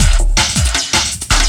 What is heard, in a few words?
LOOP, 160 BPM, Drum & Bass